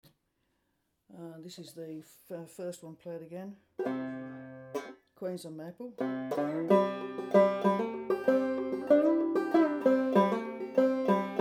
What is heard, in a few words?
fretless maple, banjo; reilly